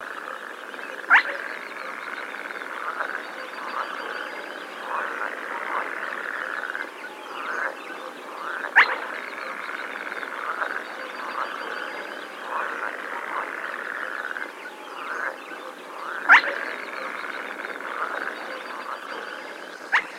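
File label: pogonysh golos, spotted crake voice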